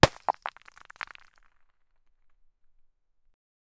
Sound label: shattering of a big rock